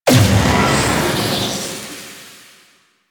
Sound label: Vaults Keyglyph Activation Void Detonation; Warframe; Entrati